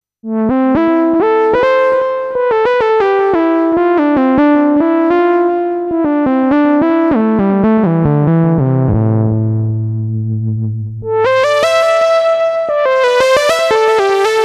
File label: Vintage Lead